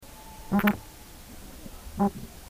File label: Pimelodus pictus; Amazon